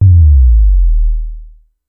Synth oneshot Sub Sweep Down, Synth Bass; Slate Digital BASSHOLE Sample Pack

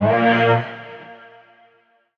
vocal tall Bb; SD AURORA Sample Pack; Slate

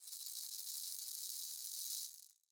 maracas Roll; Raw Perc One Shots; Slate; SD SEISMIC Drum Sample Pack

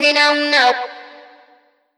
Vocal Sample Pack Phrases G; Slate Digital Omnivox Vocal Sample Pack

